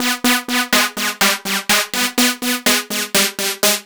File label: Synth, 124bpm, Nu Dance Electro; Zero-G; Soundclash; Combi Loops; Filthy Fury